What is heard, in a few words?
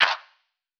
Lofi Clap; Cymatics; Lofi Starter Pack